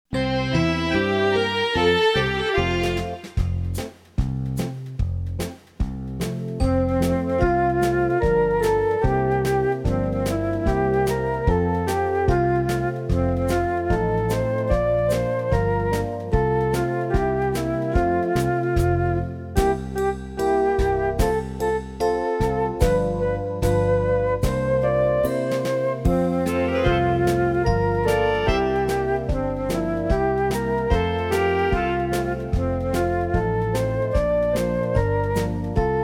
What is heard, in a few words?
Praise the Lord instrumental